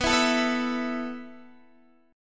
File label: B 7th Suspended 4th B down Guitar